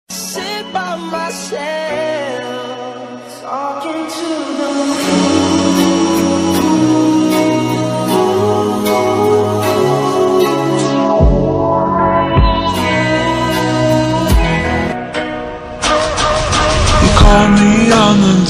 BGM ringtone

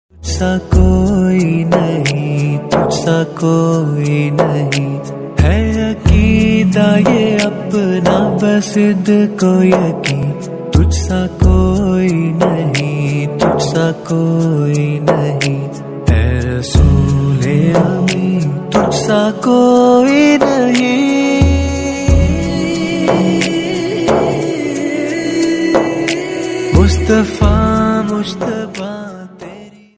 islamic ringtone

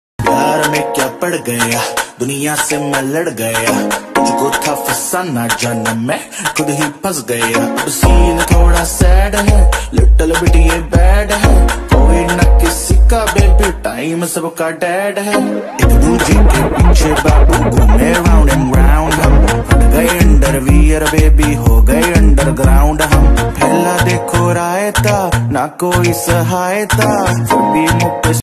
speedup rap ringtone

